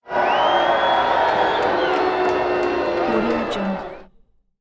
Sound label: wrestling crowd